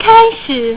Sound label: Start; RubikSolver